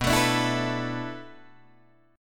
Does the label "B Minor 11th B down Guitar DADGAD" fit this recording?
Yes